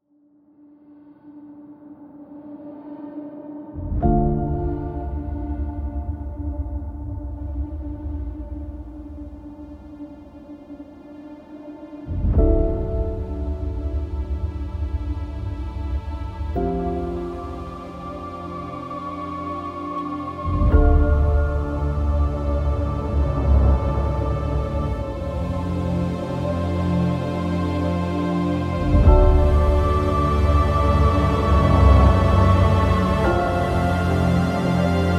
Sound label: Epic Hybrid Orchestral Trailer Music